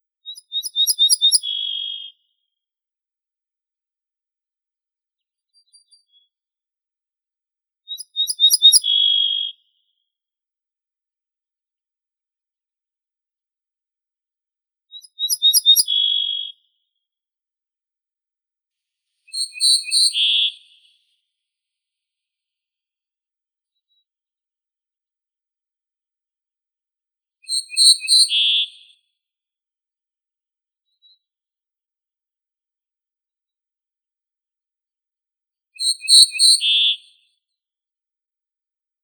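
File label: ortolan bunting Emberiza hortulana, nature sounds; ovsyanka sadovaya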